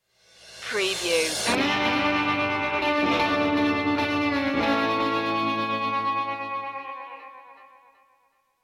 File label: lead by fortune stinger